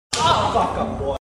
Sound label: spank